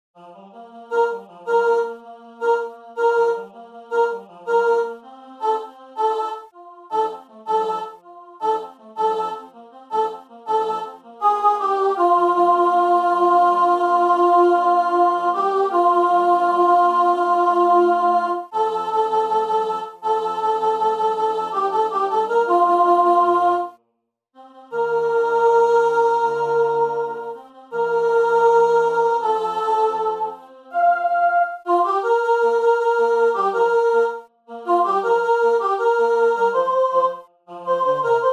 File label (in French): soprane part